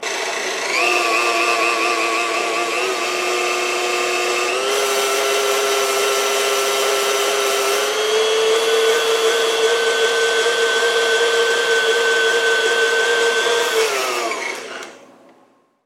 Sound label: Blender